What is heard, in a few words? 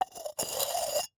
Jar, terracotta, ceramic, lid, rubbing, scraping, grating, rough, ragged, swoop; Eiravaein Works; Vaeyan IV